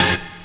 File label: RAVE, RAVESYN; Best Service; Dance Mega Jungle Rave